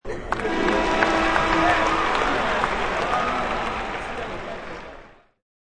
Shrt Crwd Chr Airhrn, Arena crowd cheers and airhorn blasts.; Short Arena Crowd Cheer with Airhorn; Backgrounds; Arenas